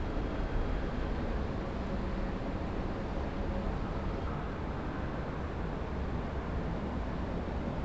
ambi morning; Mafie 1

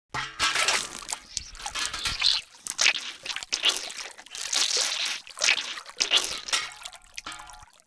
mud; Mafie 1

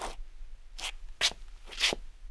preslap; Mafie 1

